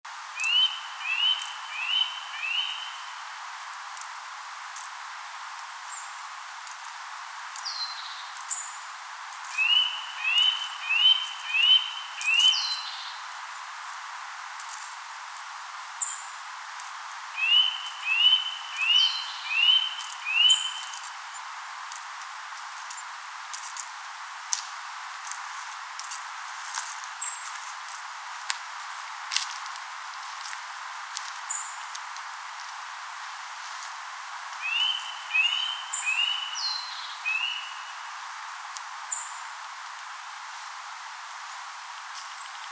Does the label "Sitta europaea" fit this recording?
Yes